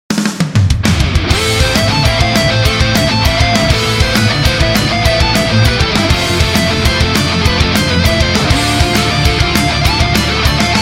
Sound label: Chords, rythm